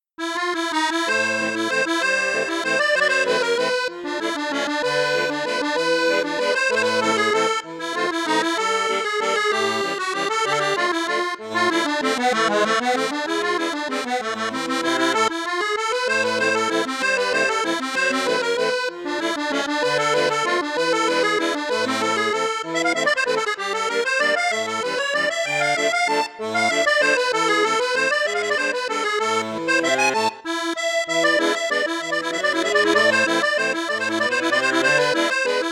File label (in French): registre flute juste flute haute, accordeon; maugein amusette